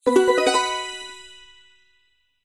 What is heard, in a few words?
extra pointer; willyshotchillies